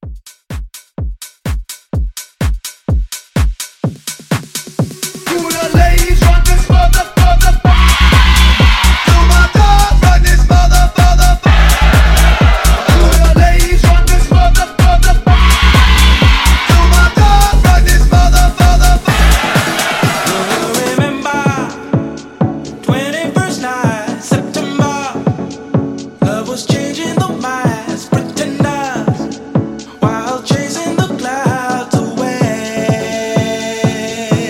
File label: Club 126